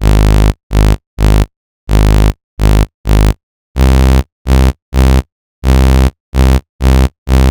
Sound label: Saw Synth, 128bpm, Nu Dance Electro; Zero-G; Soundclash; Combi Loops; Pride Joy